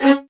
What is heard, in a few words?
ATT C#4, LOUD VIOLINS; Q Up Arts; Denny Jaeger Master Violin Library